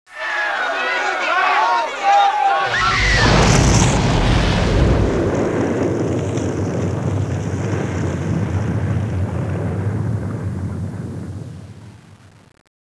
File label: NUKE EXPLO; CIV2; WARHAM